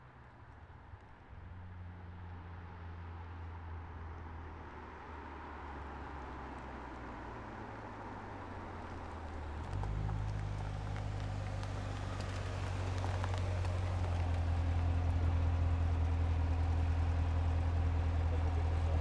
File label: corvette arrive, Corvette C7; Chupapsound